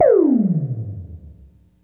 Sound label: Zap